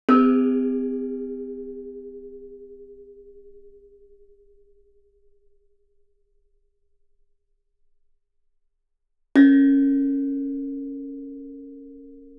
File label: Gamelan; Propeller Island - Complete Gamelan